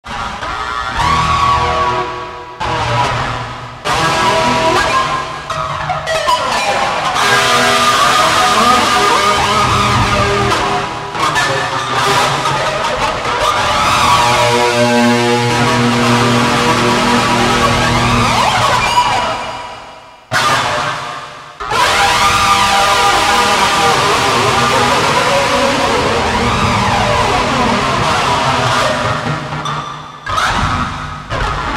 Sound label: Ibanez Lockdown Tremelo, Guitar Licks